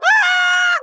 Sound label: screams